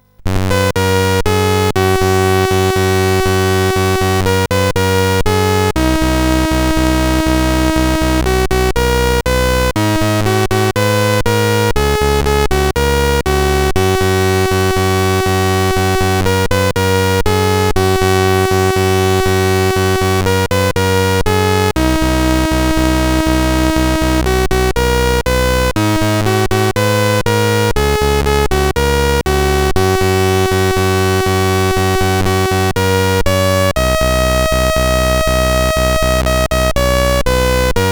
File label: Space Escape, CSG8580R5